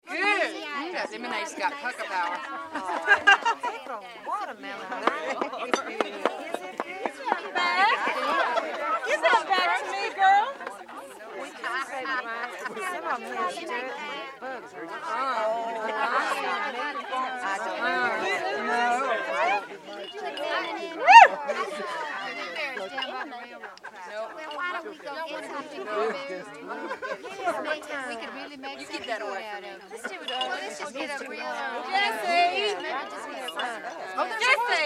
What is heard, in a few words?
Picnic Party Chatter, American family - deep south; Holidays; Voice; Tom Hutchings